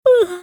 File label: SMALL YAWN; F.A.M.P.S